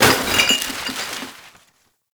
Garbage Bag Destroy; Warframe; Ambience; NineteenNinetyNine

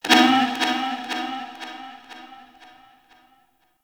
Ship Energy Bounce; Warframe; Corpus Ship Remaster

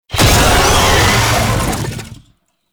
Tank Infested Vocal Pain; Warframe; Lasrian Tank

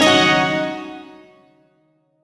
Shawzin Yo Chord L; Warframe; Duviri

